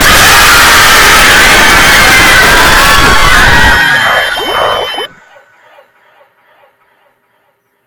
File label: jumpscare